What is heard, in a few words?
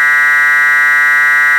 BUCHLA D3, Rare Synthesizer; Hollywood Edge; Giorgio Moroder Rare Synthesizer Collection